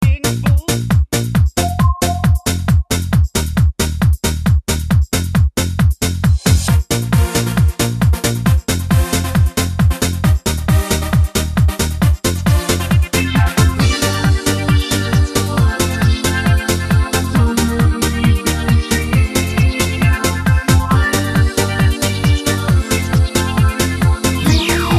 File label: Karaoke